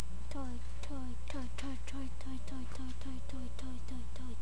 toy

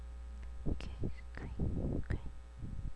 quack

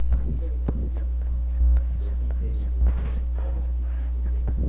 spoon

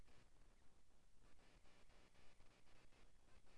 bowl

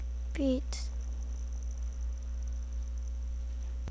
beat

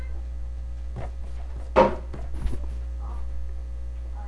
rake

